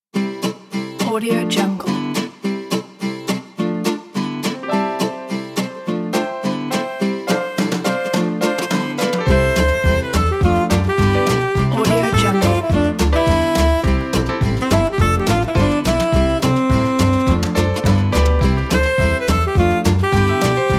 gypsy light jazz

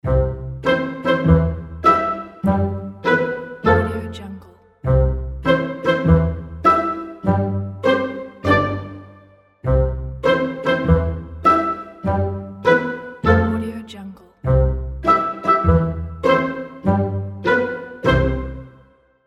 comic comedy fragment

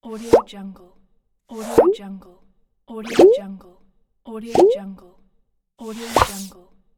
pop up

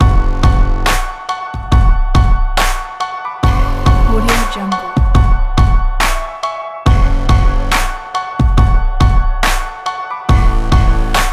energetic powerful modern electronic rock

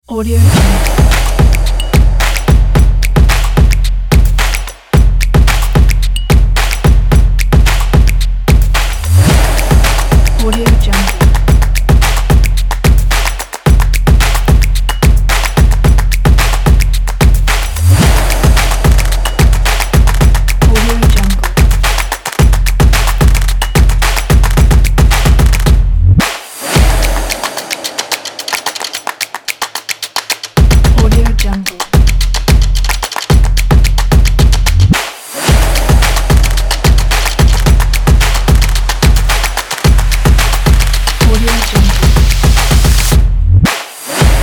the drums

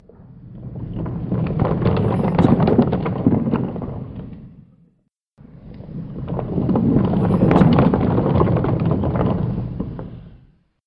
cart passing by; urbazon

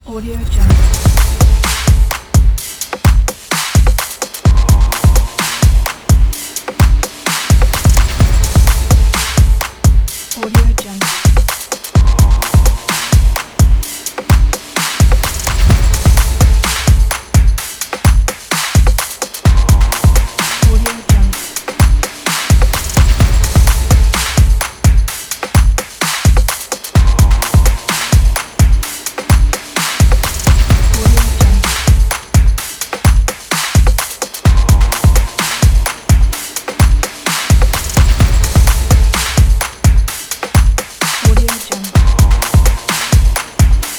drums trailer; anderfast

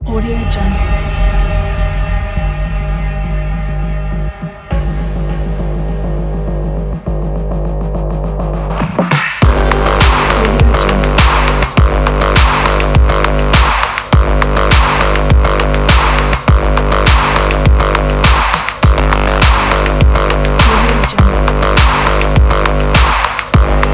intense electro cyberpunk

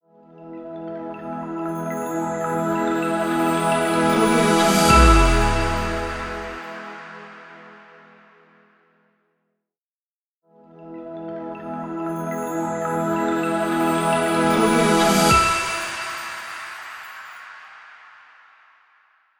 revealing logo

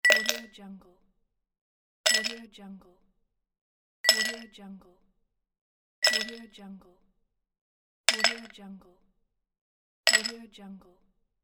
ice cubes in glass

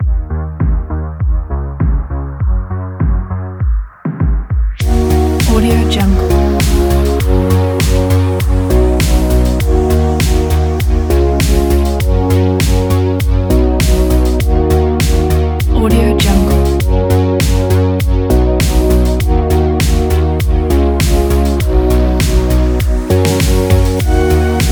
80s synthwave

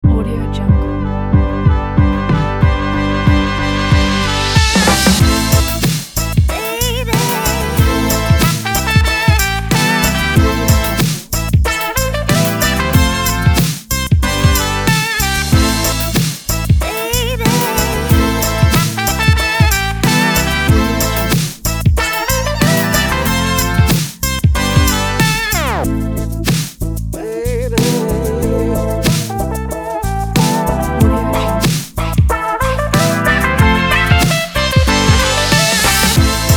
hip hop

